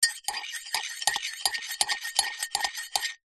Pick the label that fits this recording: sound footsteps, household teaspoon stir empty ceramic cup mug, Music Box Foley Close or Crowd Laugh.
household teaspoon stir empty ceramic cup mug